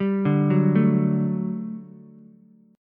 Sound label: G Major 7th Suspended 2nd G down Ukulele; GM7sus2 arpeggiated